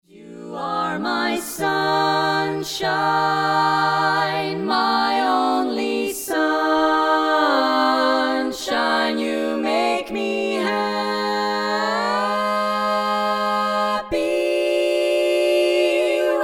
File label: female